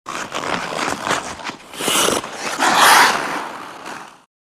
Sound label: Hockey Player Skate